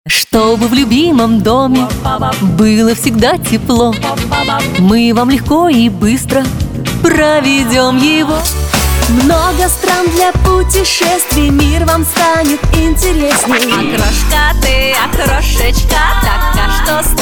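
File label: vocal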